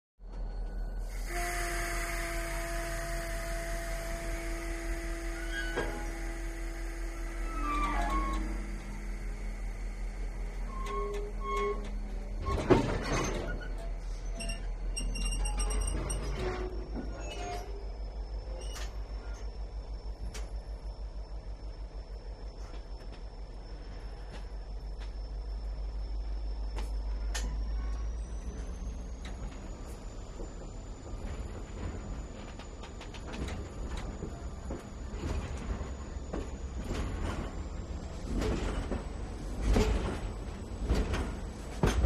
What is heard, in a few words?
Train Exterior, passenger